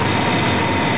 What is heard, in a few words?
jetpak; duke